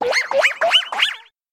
Brionne Cry

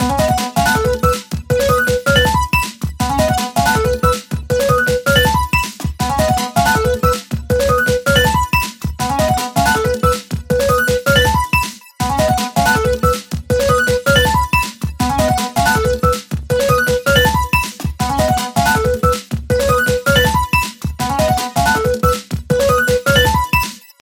Beat

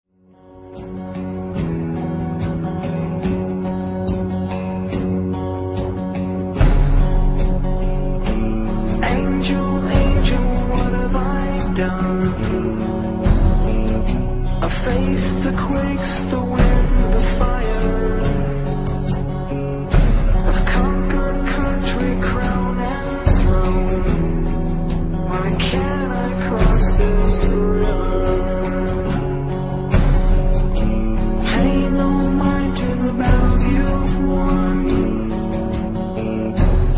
short tone

